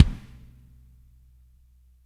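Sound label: warm kick; Spectrasonics; Burning Grooves CD2; PICC. BALLAD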